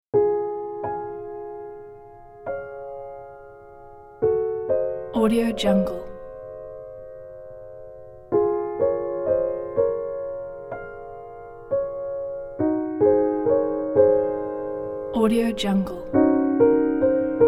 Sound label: clair de lune slow piano intro; debussy